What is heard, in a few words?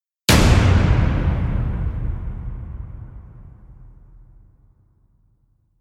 slams annihilate